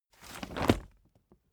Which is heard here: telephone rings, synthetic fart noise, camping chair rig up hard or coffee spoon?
camping chair rig up hard